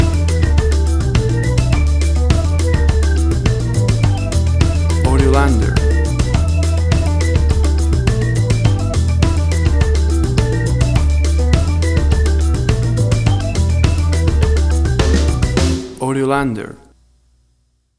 Bossa Nova Latin Acid Jazz Music

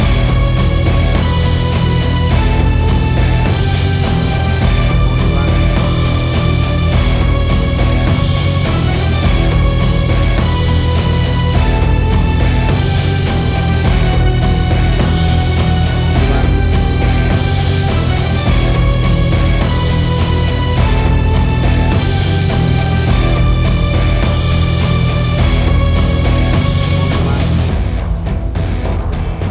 Big Epic Heavy Metal Action Music

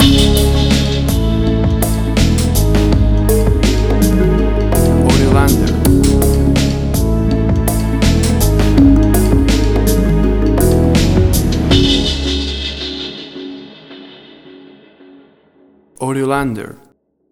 Uncertain Orchestral Hip Hop

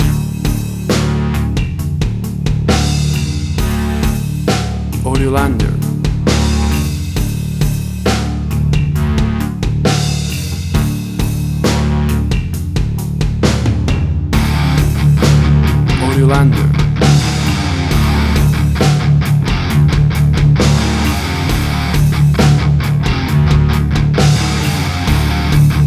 Burn Pyrophon Burn, Hard Rock; Heavy Metal

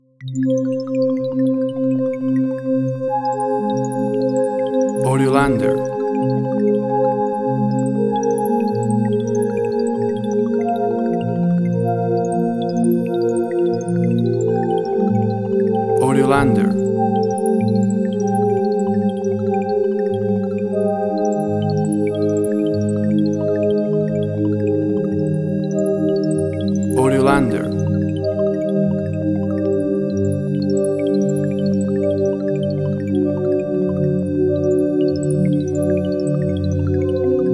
electronic ambient; SciFi style ele; ELECTRONIC AMBIENT 1; AUDIOLANDER

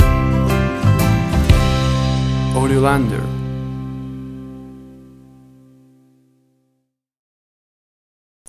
Country Waltz Romantic Ballad Stinger

